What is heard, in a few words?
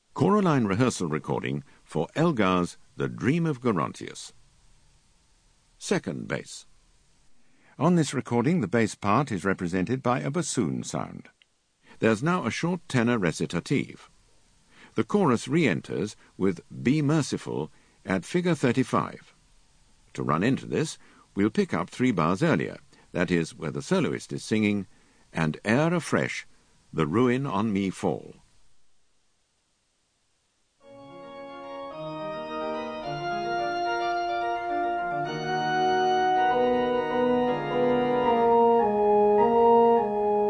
Bass; Elgar Dream